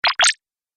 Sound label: Laser Unlock; Laser Sound Sound Effects